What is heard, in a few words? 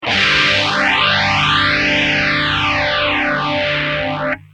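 guitar sph323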